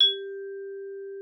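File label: xylophone G4; Xsample VOL-4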